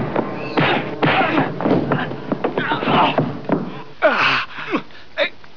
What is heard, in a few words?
Fight; 特酷声音素材库